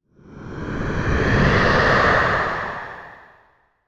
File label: movie trailer whoosh hit